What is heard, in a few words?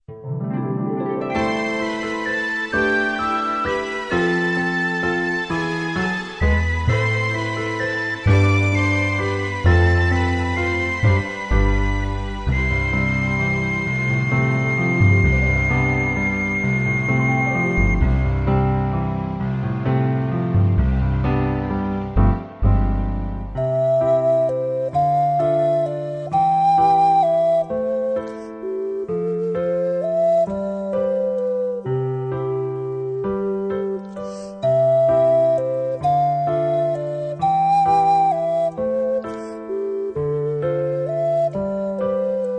Ocarina